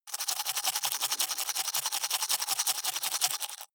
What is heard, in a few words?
teeth brush dry open inward fast